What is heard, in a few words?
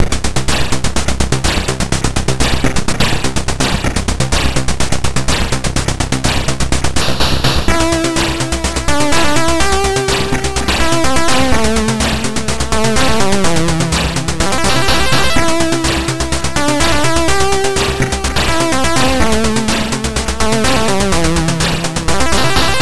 c64 title; bmx simulator